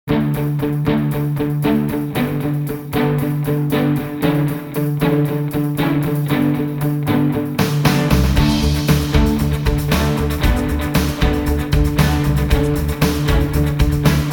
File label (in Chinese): 震撼音乐